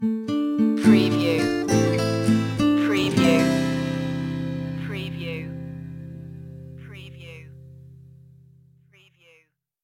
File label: idents acoustic